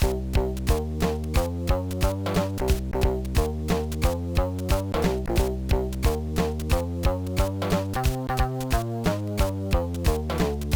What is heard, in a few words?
BGM; Minna no Soft Series Minna no Mahjong 2003 GBA